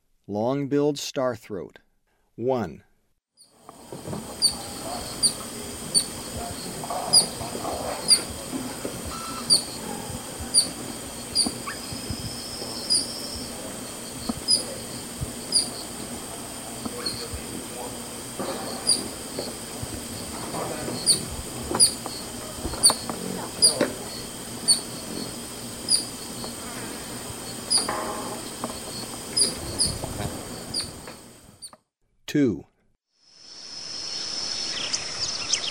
Long-billed Starthroat; S. America; Eastern Lowlands

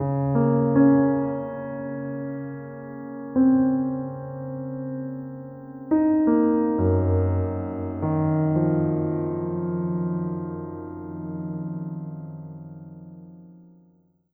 SLOW SILHOUETTE

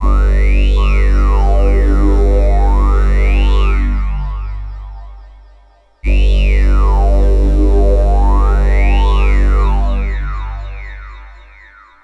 BASS

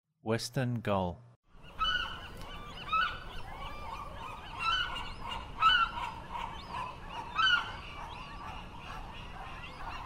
Western Gull, Songs of Birds of North America; Western USA; Western USA Songbirds

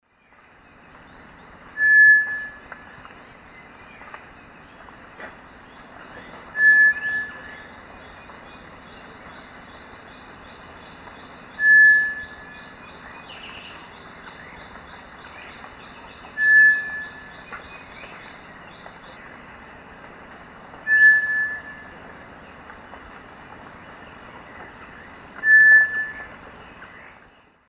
Crypturellus cinereus, song; S. America; Eastern Lowlands

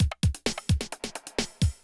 electro kit 130

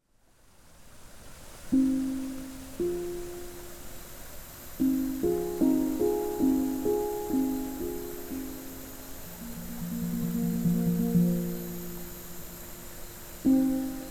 Rainforest Waterfall Relaxation With the Hang

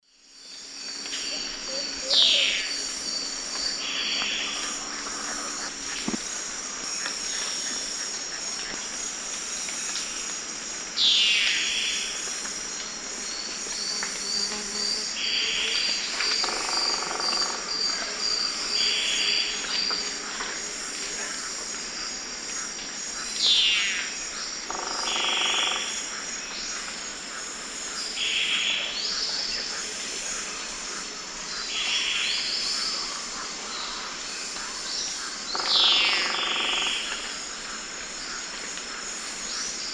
Dixiphia pipra, songs 2 birds; S. America; Eastern Lowlands

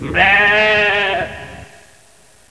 LAMB

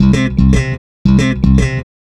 SWEET & LOW BASS

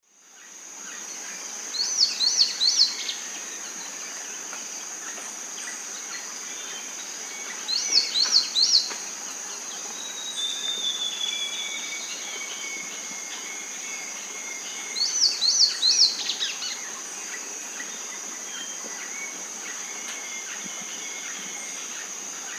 Myrmotherula sunensis, song; S. America; Eastern Lowlands

